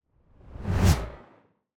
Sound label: Whoosh, Whip By, Airy to Whine, High; Sound Design